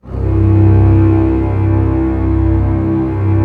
Orchestral f Slow, String Sections; Roland LCDP13

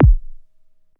MKS80 BD; DANCE KIT

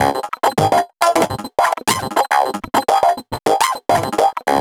Drum Loop, Drum Loops

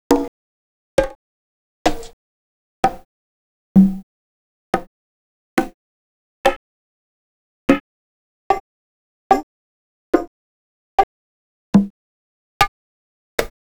Vinyl Congo Bongo; X-Static Goldmine

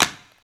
Human Perc, Body Perc; Spectrasonics; Supreme Beats; World Dance

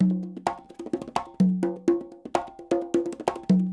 SHUF, Percussionworks

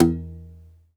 domra, plucked strings; Segundo Domra; E-MU Producer Series Vol. 7 – Old World Instruments

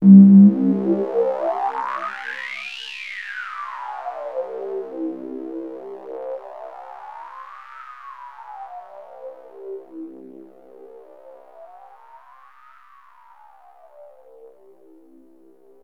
Access Virus B; Synthworx

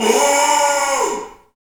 Bullhorn, Afro Horns, Africa

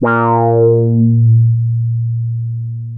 A#3, Bass; E-MU Producer Series Vol. 6 – Analogia Project (CD 1); Boom da Bass 1

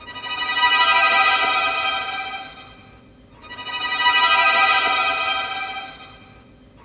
Violins, Cinematic; Sonic Foundry (Sony Creative Software); Orchestral Series VOL-3 - Cinematic

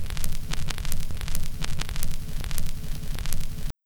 Vinyl, noise

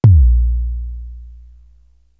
808 bigfoot, TR-808; East West; Global Sounds

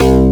FM8ST, SYN BS; AKAI S6000 CD-ROM - Volume 1; SAMPLE WAVE MIXING 1

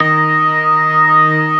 STOPS, organ combos

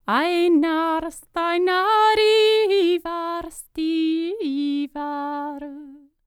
Nord, World Voices; ILIO; Vocal Planet VOL-5

